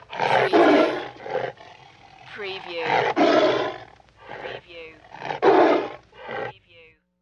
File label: Tiger Roars Three